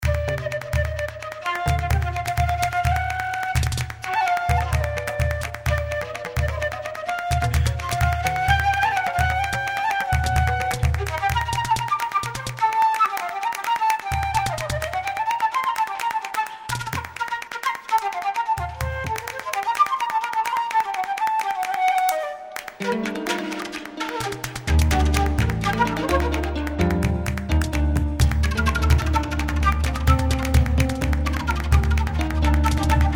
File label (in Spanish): Bule segun, flamenco flute